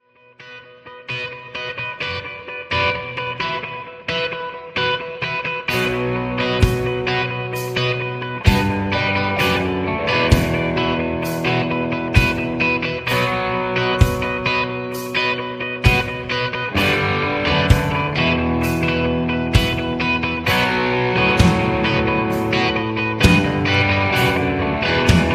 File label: riff ringtone